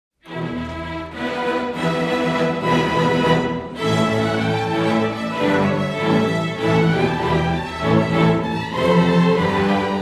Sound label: Square Dance